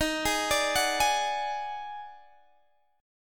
E flat Minor Major 7th Double Flat 5th, Guitar, Open D